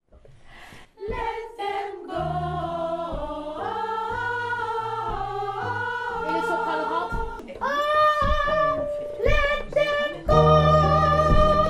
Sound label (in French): partie soprano, gospel